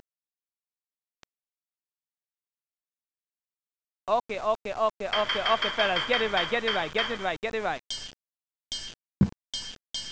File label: steelband